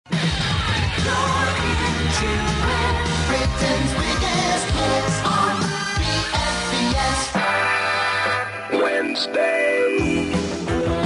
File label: gold opener; bfbs